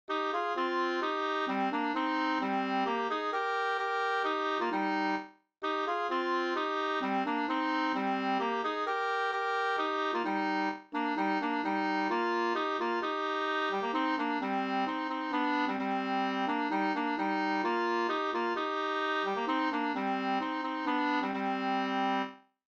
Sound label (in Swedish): langdans ringdans; fr Og Ahlstrom 2st